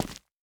Footstep Asphalt; this is the zodiac speaking 2020 gamerip switch ps4 xbox one pc macos

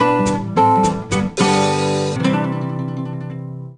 Jingle Normal; tamagotchi connection corner shop ds; Mame

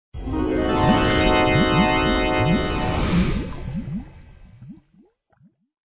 Game Over; SpongeBob SquarePants The Krab-O-Matic 3000

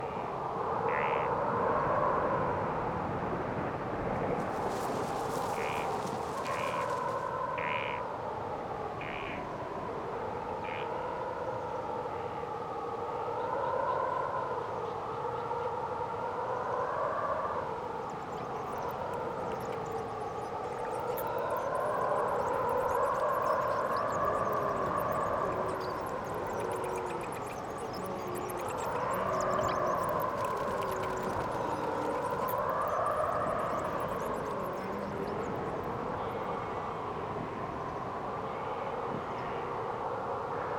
Canadian Wilderness Ambient; X2 Wolverine's Revenge; Nintendo GameCube

